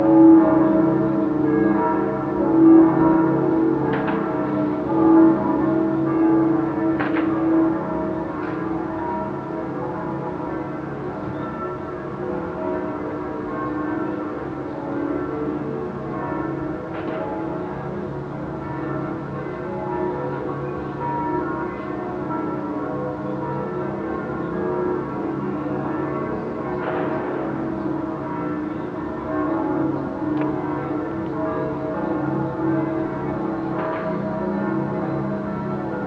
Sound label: Bells Distant Berlin; Sound Scapes